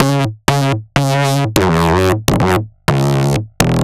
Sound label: Glitch n Glitz, Synths; Sonic Foundry (Sony Creative Software); Underground UK House & Electro